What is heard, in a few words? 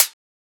Closed Hat; FLPRO